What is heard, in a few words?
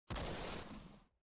enemy shot; Mission Terror